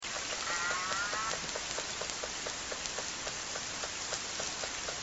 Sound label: J quiet, orca